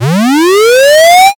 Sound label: charge